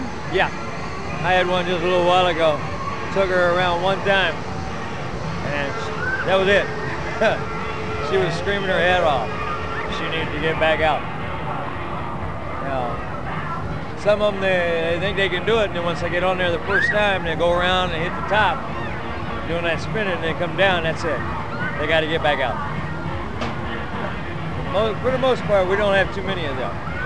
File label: screamer; statefair; skydive